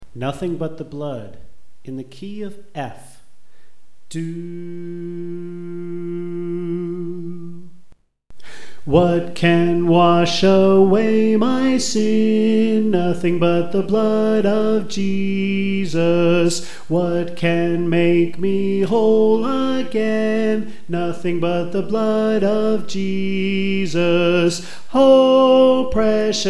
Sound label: Soprano